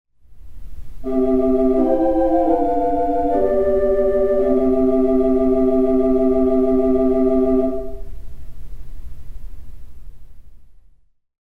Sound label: Tibia Clausa, theatre organ